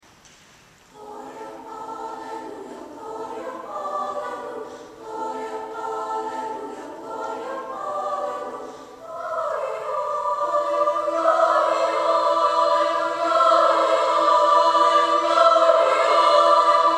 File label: gloria alleluia chamber choir